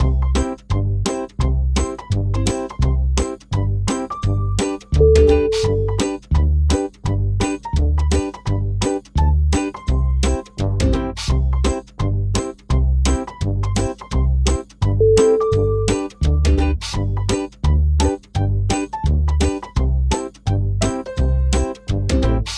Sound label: amusing ragtime